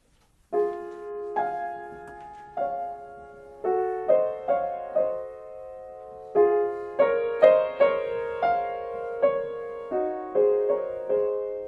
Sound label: erard grand fortepiano